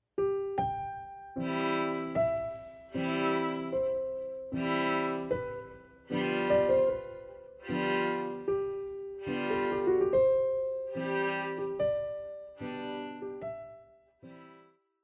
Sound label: Beethoven Violin Sonata; op12 no3 movt2; Violin Sonata No 3 Movt II